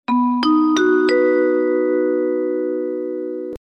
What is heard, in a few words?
call to attention 50 percent volume; EstimationPoker